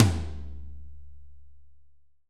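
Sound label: AMBIENCE TOM; AMB KIT#1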